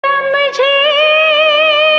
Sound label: Hard Bass Mix